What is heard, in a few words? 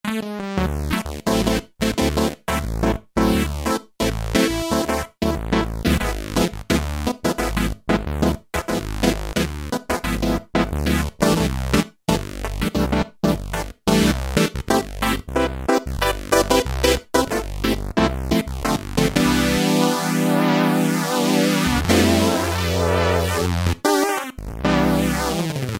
BIPHAZE SAWZ, Kurzweil K2000; Kurzweil K2000 Contemporary ROM; Contemporary ROM Programs and Demos diskette; ANASYNTH